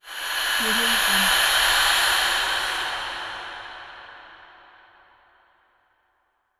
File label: cinematic breath